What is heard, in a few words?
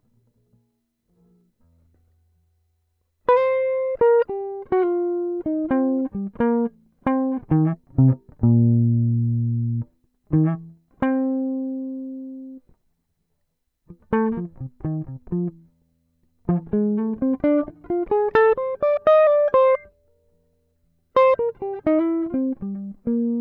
12 bar blues solo slower